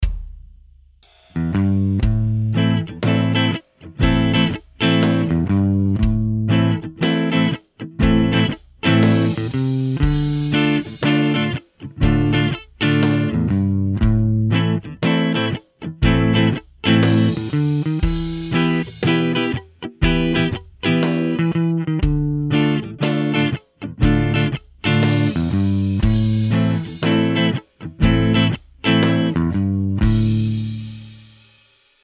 blues A minor drum rhythm 120 BPM

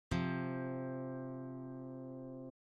intervals harmonic perfect fourth, guitar

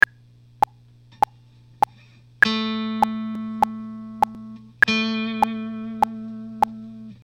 vibrato; beginner techniques; guitar

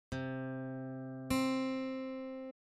interval octave, guitar

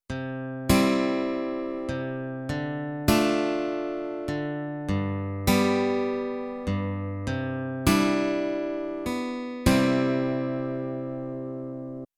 thumb brushing, guitar; finger picking

